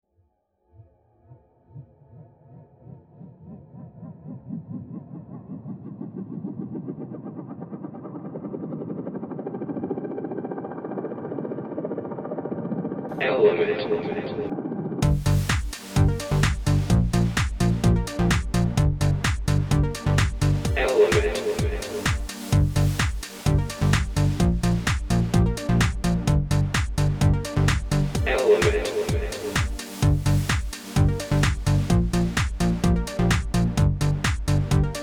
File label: klaver techno